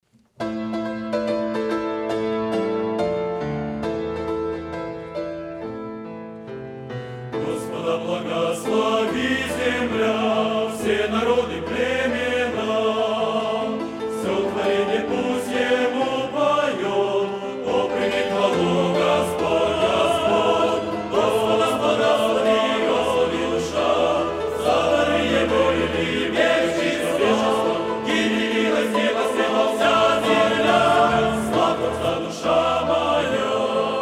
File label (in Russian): пение хора; Молодёжное общение г. Курганинск; Господа Благослови душа моя